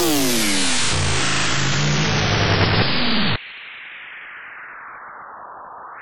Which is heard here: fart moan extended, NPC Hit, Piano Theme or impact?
impact